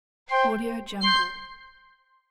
flute whistle confirmation sfx

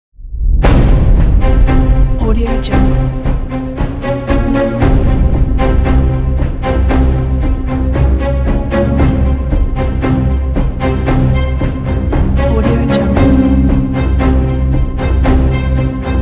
suspenseful tension build cinematic

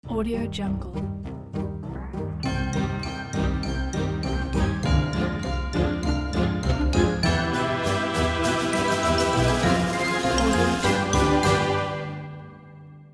halloween logo

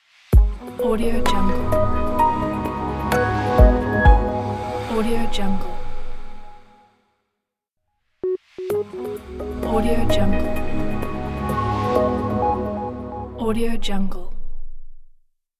ambient logo

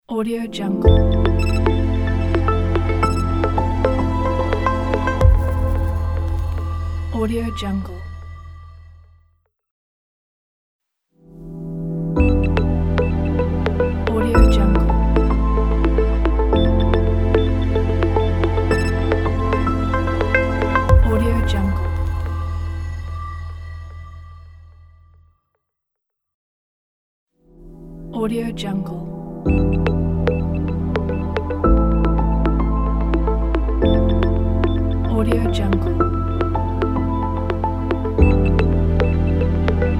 abstract ambient intro ident

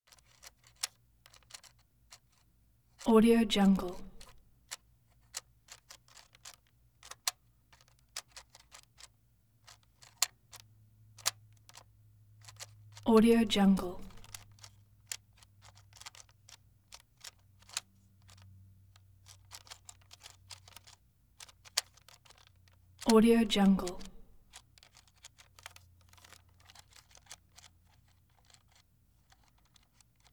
game controller nintendo 64; prosoundfx

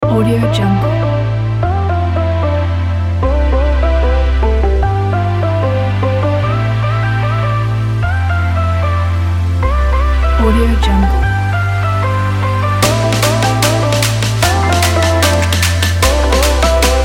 future bass

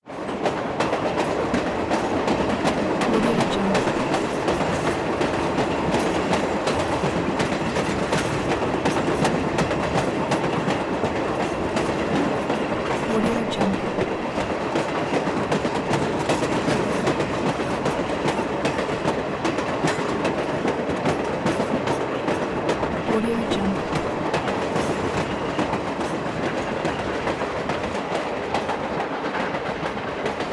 train constant exterior

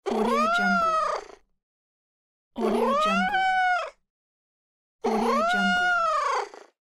cat meow

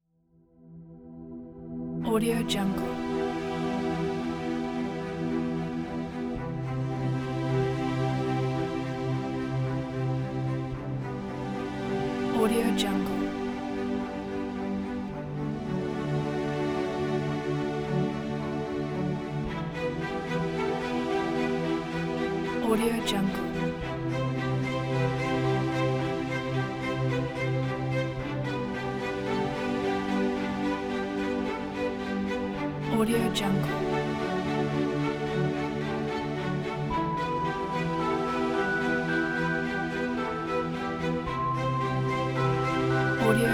documentary emotional strings

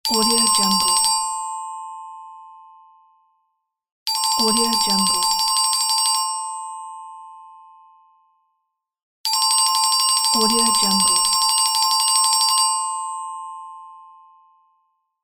slot counting

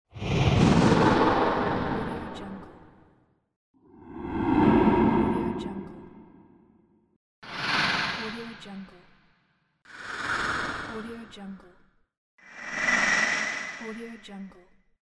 dark cavern voices

